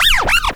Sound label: scratch